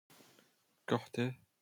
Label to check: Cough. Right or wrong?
Right